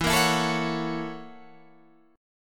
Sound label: Gb 7th Sharp 9th E, guitar